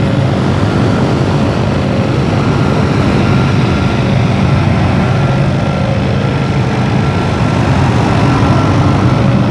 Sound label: armada speeder engine loop